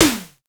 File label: Snare, Fairlight CMI III